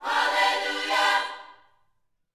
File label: Vocal, Gospel, PHRASE; Spectrasonics